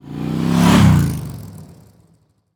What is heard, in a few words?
motorcycle pass; anemoi